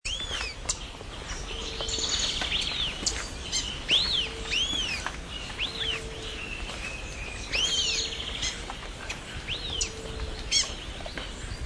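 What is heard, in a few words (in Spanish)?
yetapa negra, aves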